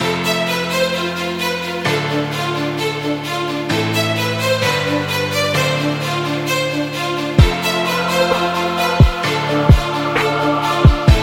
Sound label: Epic Hip Hop; movie trailer